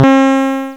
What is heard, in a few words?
game over